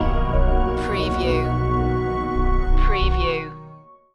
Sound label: stinger